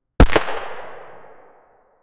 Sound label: a gunshot loud reverberati; firearm